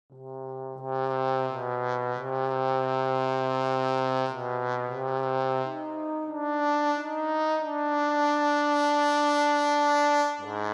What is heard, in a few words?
sea monsters brass trombone